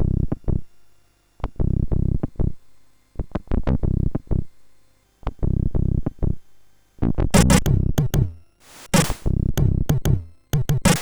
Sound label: Bass Loop, MOS6581R4